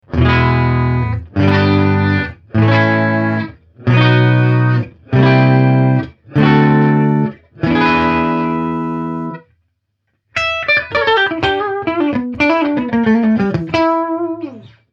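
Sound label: alumin bridge clean, strat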